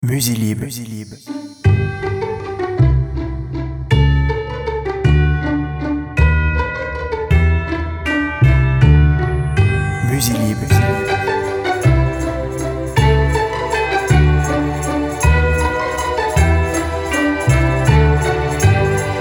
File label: MERRY CHRISTMAS